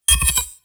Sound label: gauss precision stop; doom gauss